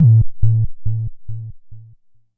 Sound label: Jomox MBase, drum machine; Jomox MBase 01